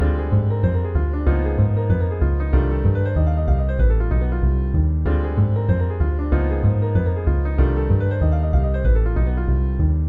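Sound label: voicings with bass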